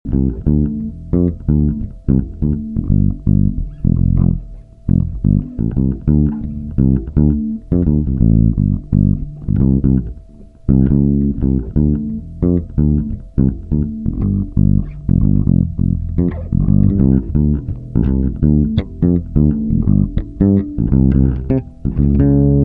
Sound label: Funk Bass Riff D G F 85; Discount Funk Bass Riff